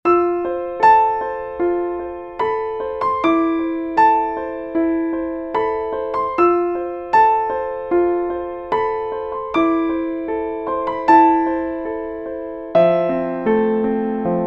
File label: Dense Hall, Roland SRV-3030; Preset